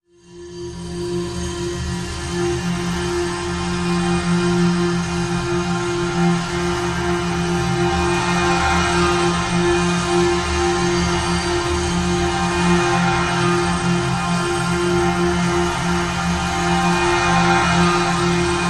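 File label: drone light abrasion F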